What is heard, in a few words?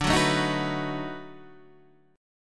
B 7th D; B7/D strummed; JGuitar